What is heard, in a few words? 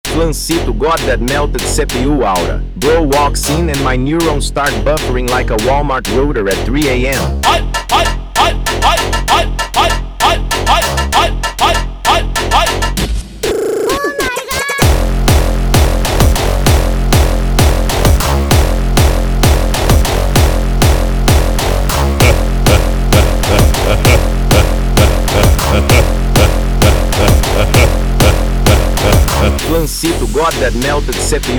Hardstyle